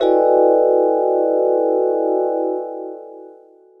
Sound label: clashing vibes